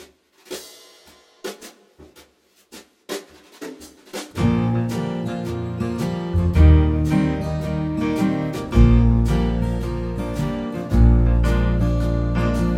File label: Bb warmup slow blues, cornet